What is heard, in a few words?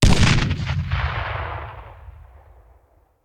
Grenade Hand; Sound Ideas; Guns